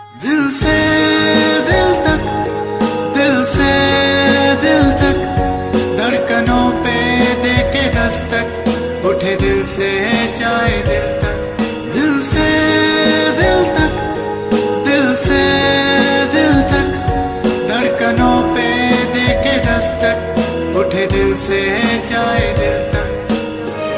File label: Bollywood Song Ringtone